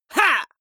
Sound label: male fight shout short; comedy-cartoon; soundpool